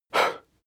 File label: male breath fast out; menschen; atmen; soundpool